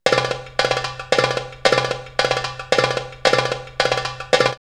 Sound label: Bedouin Break 112, drumloops World Ethnic; X-Static Goldmine